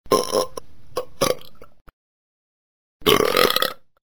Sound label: burp